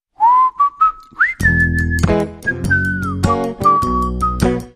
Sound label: Whistle, Sting